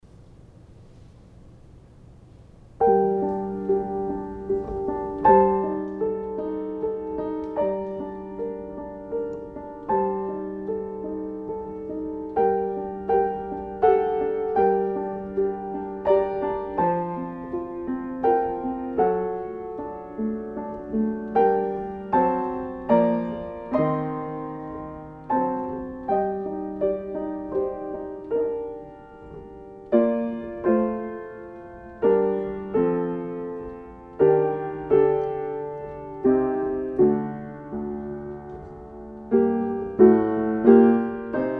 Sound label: Coventry Carol Traditional English Melody